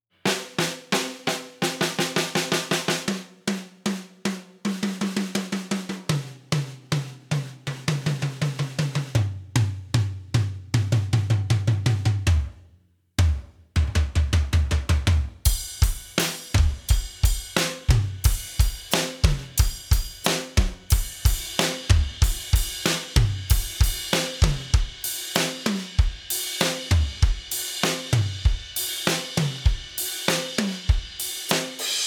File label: Sonor Drums Rock Mix